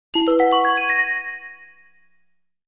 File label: game over